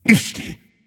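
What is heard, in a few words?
non verbal ouch, voice; cernunnos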